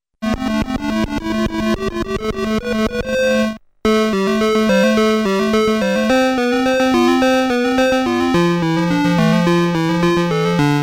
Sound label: Thrust, Atari Game Sounds